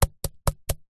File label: sport tennis racket hit grass x4; zapsplat